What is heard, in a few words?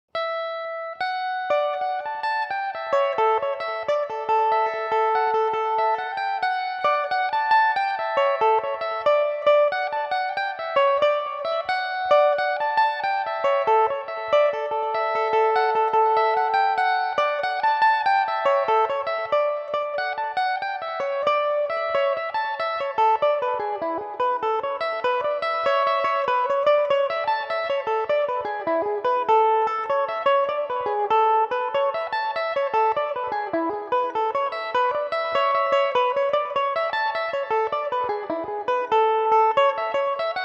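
D major A major belmuse, irish mandolin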